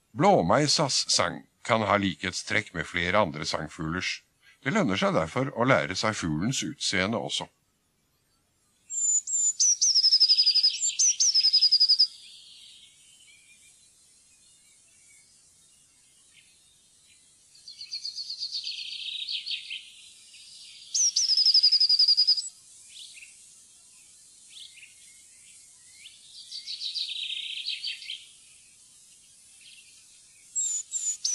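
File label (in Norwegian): blåmeis, fuglelyder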